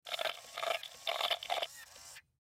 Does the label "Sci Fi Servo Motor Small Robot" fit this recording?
Yes